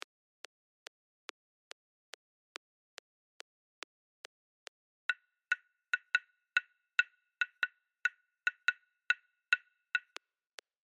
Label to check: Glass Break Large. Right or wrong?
Wrong